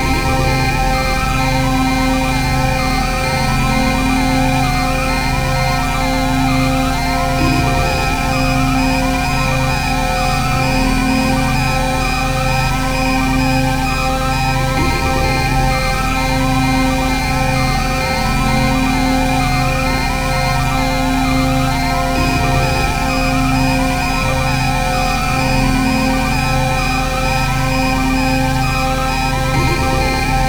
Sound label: wind computer; networkbrain; wind computer 2